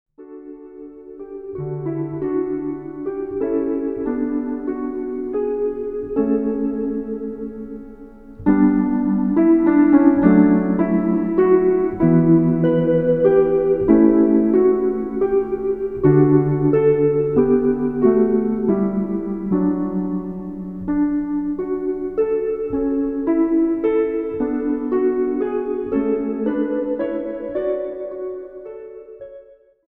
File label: Prelude in D major, Keyboard Studies